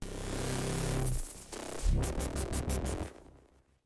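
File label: random synthesizer sound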